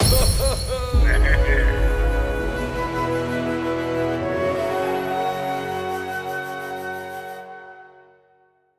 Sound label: Menu Screen Victory; Silver P2; unruly heroes